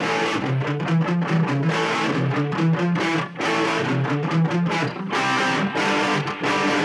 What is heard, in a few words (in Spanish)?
Guitarras